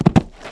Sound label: rabbit die; spring